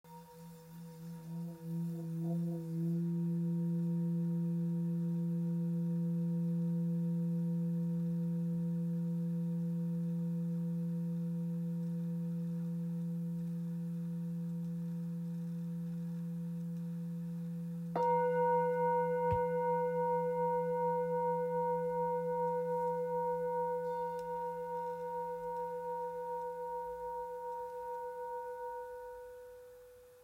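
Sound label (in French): Bol full moon fa, bols chantants tibétains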